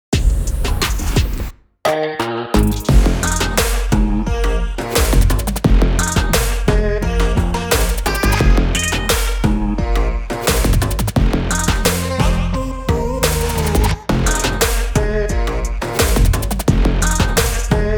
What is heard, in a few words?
MUSIC BOX REVENGE